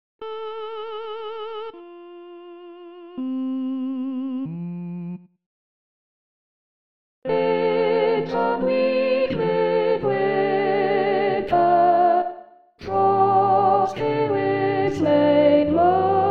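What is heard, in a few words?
In the bleak Soprano; In the bleak midwinter; Holst; xmas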